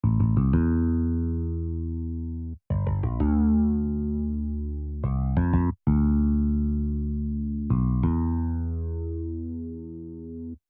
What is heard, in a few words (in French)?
compo msm basse